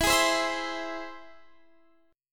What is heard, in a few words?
E Augmented E down Guitar Half Step Down